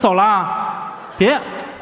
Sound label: LockChime; 岳云鹏-走啦别